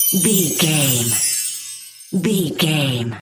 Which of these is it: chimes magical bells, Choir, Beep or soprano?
chimes magical bells